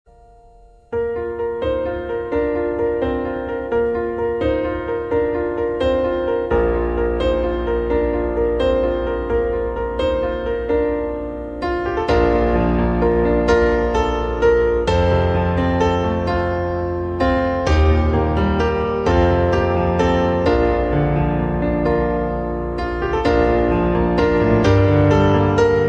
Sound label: piano